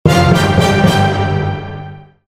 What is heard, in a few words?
Token drop friend; player; GES; gesource; xshemps customs